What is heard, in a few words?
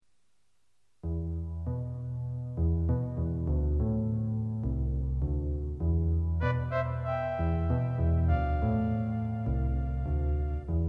Jazz alternated